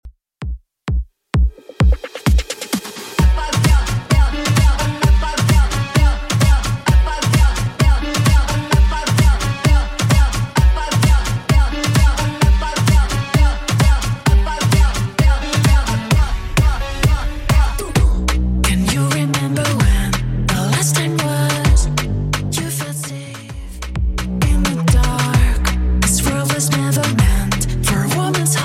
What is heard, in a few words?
Top40 Calle Mix 130bpm